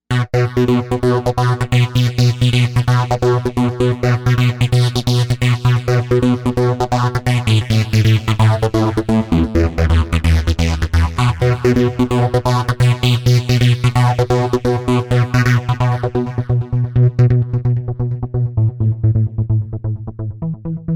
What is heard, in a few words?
Overdrive, Novation UltraNova; Factory Patches